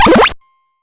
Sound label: collision, 8bit